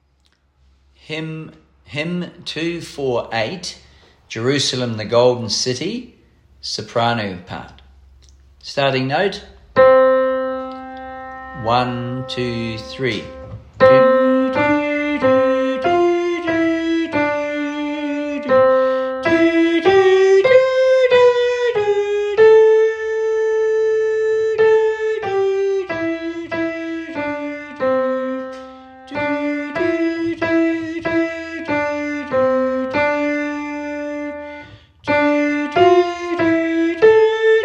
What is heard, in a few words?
Soprano, SATB; Jerusalem, The Golden City; Hymns for Jubilation; in house choir